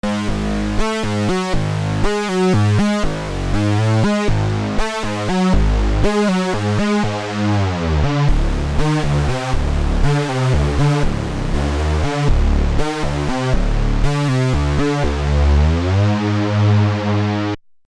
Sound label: 90 OSC INDUSTRIAL UNISON; Redshift 6